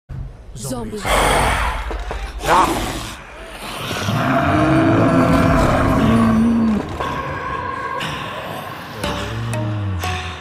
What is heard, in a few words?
title bgm ringtone interval bgm; zombie reddy